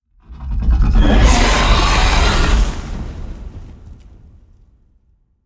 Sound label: Dragon sound